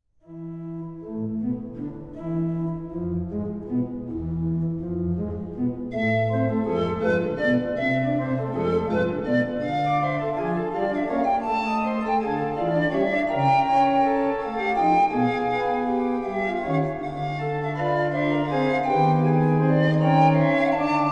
sonatine voor panfluit en orgel, andante sostenuto

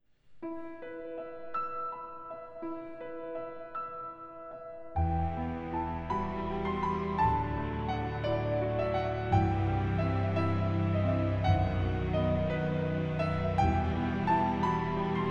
kerstmedley instrumentaal

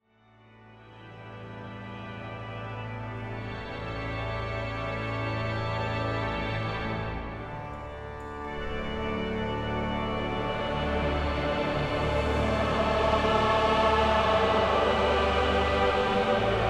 orgelimprovisatie psalm 84 en samenzang vers 1 en 3; Geloofd met psalmgezang

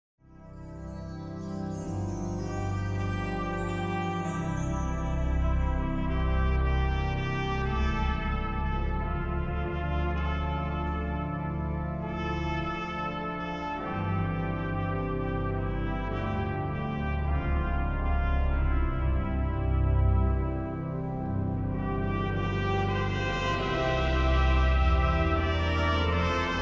instrumentaal

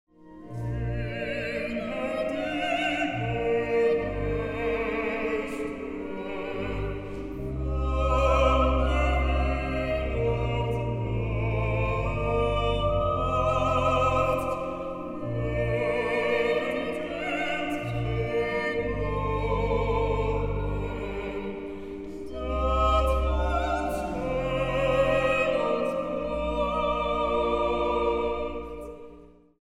duet; Kerst met Joh de Heer Nijkerk

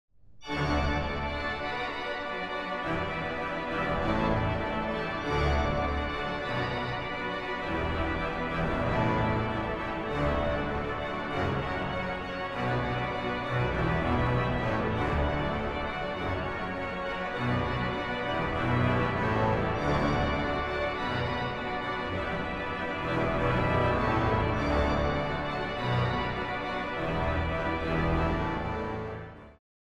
orgelimprovisatie psalm 150 aansluitend samenzang vers 2; Psalmzang vanuit Rotterdam

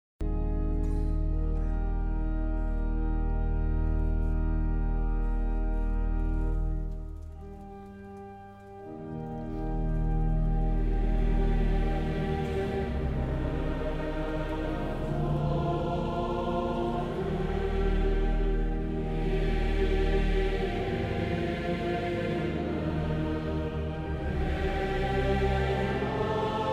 orgelkoraal en samenzang psalm 123

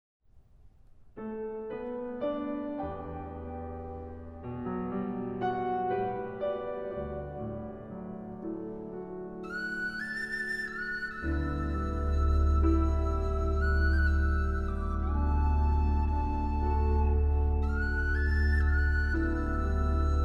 instrumentaal intermezzo; uit oratorium de schepping

